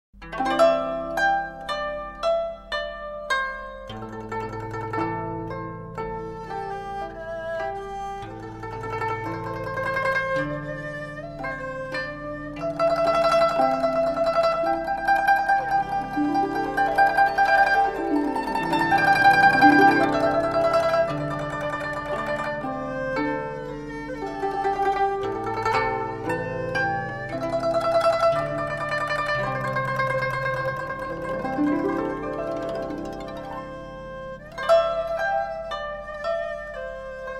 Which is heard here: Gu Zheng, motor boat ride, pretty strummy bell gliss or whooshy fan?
Gu Zheng